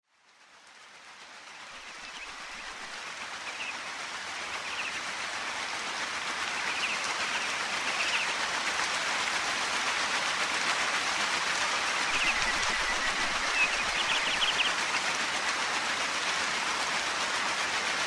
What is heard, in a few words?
Deep Meditation birdsong